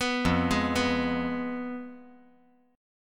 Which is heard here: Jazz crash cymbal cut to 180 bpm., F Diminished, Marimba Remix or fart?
F Diminished